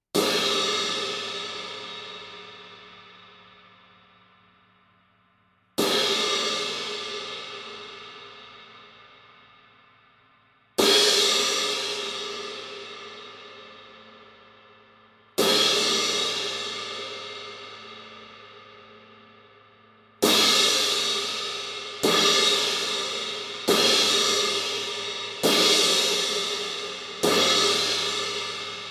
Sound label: Epic SE Crash, cymbals